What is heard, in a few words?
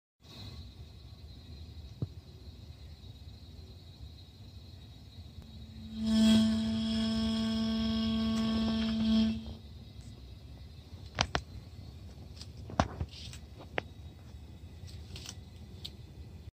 Gas meter; کنتور گاز